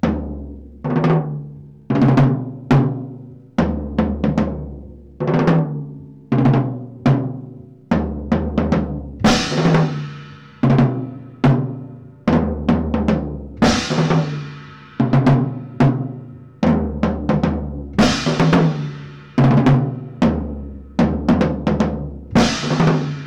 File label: tom tom pattern 110, acoustic